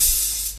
1980s loud reggae open hi hat f sharp key, Royality free open high-hat tuned to the F# note.